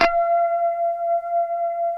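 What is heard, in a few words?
F#5 harmonic, guitar; Spectrasonics; Hans Zimmer Guitars VOL-1; 90's Sample CDs; HYPEMONICS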